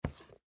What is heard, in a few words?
Tennis Shoe Pick, Tennis Shoe Pick Up; Sports; Foley; Blastwave